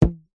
foley rubber band ping; zapsplat

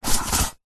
soccer ball spin in hands; zapsplat

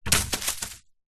tree branch drop ground leaves; zapsplat

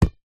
sport ball netball cheap catch hands

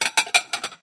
household kitchen plate cupboard put away clatter

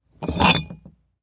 foley bottle remove from box of others; martinimeniscus

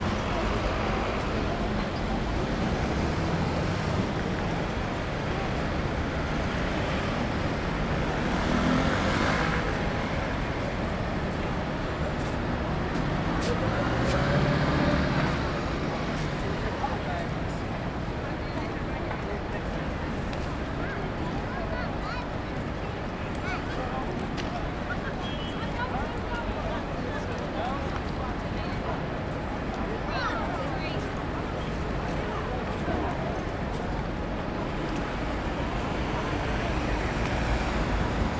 ambience london oxford circus traffic people

